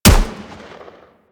M16 Rifle Shot; GrineerM16Rifle; Warframe; Weapons

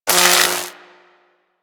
Lightning Rifle Spark E; Crp Chain Lightning Rifle

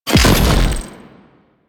Wall Eye Explosion; Warframe; Sentient Devourer

